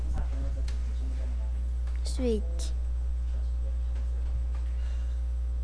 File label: switch